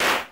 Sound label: Punch; RedDot